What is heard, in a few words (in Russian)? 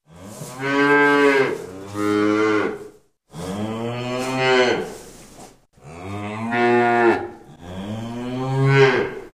Корова; Звуковое лото